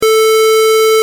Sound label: synth